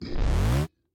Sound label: Death; Black Hat